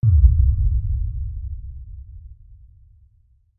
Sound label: Bass Impact Low Tona; impacts